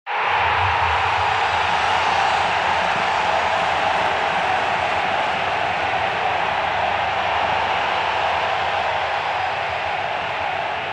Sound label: crowd score